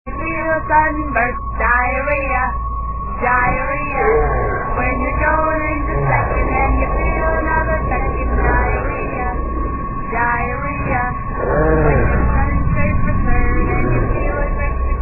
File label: Diarrhea Song (Pitch Shifted)